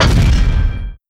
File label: reset closed, combine plug